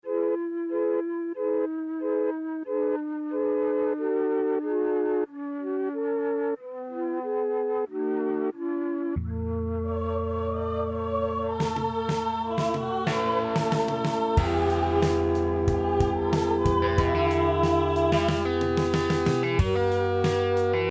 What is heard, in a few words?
melotron arturia flute